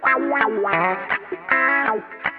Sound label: GUITAR